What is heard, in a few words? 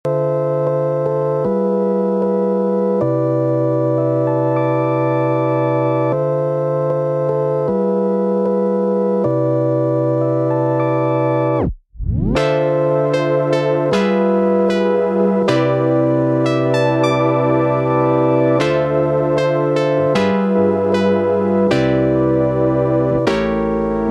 lofi; GameOver